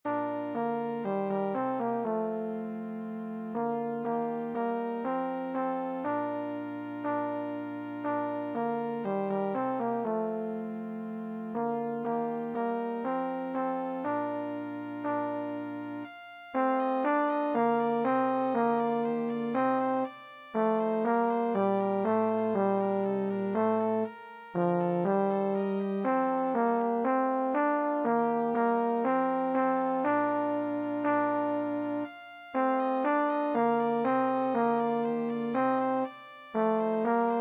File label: ding dong; Ding Dong Merrily On High 2010 TENOR PART